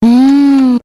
"mmm" female, Voice; ooh ahh

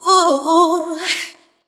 "ooh ooh" SUNG

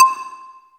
Bells

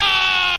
"ah", SCREAM

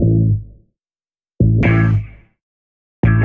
guitar blues, Loops, Phonky

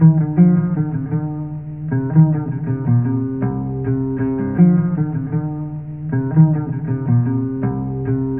bass, Loops

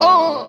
"uh" SUNG; ooh ahh

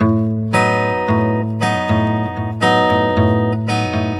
guitar

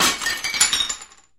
glass shatter

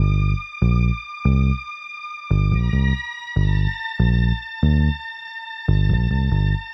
keys and bass, Sad; Loops

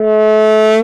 klissando, Horns; Notes

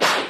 kung fu, Swish

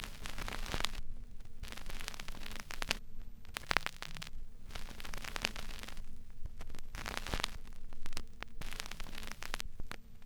crackles, Vinyl

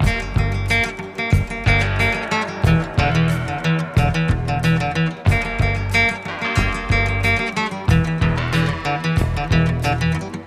guitar offkey, Loops

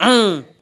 uh

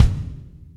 Kicks Loud

